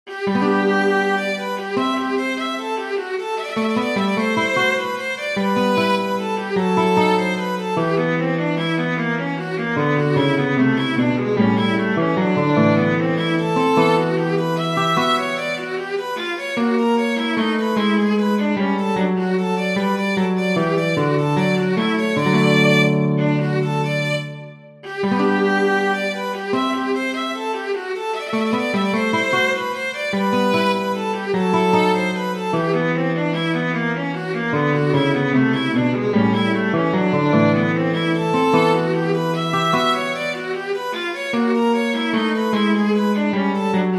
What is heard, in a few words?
courante major for viola guitar; telemann georg philipp